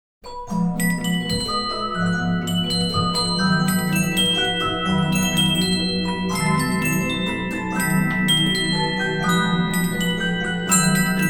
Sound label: lochmann original tublar chime